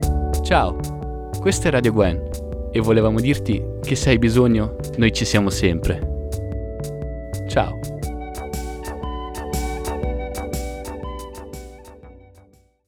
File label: Jingle